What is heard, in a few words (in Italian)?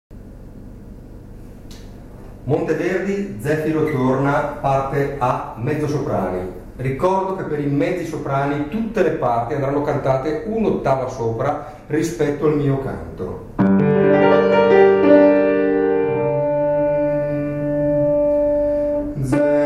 ZEFIRO TORNA mezzi sop